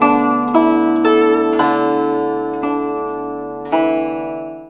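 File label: guzheng; Spectrasonics; Heart of Asia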